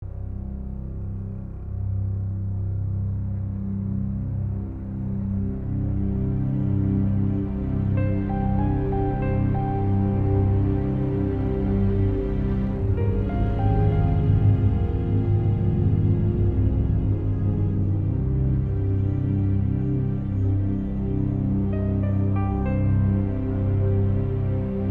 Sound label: Ominous Loop